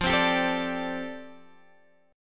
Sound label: F 6th Add 9th F Guitar Open G